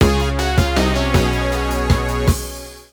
TV End Jingle

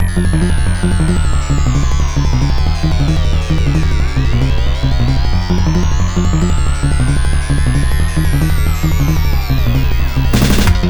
Disco; terminator the genesis

